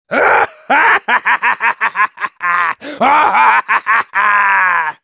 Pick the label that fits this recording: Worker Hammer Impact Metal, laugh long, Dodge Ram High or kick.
laugh long